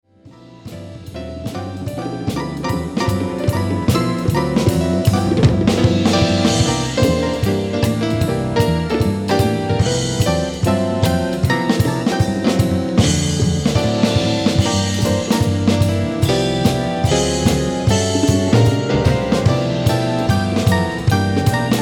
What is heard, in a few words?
jam